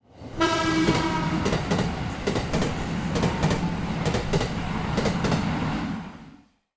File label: train pass